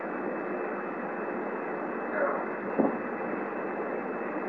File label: yelp; bienvenue house